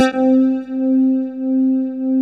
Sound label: ANALO BAS, ANAL BASS